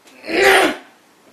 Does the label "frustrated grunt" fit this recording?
Yes